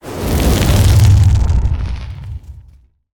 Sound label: Fire Swooshes Wind